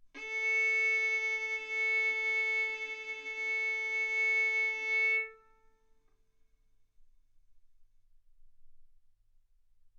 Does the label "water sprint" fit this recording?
No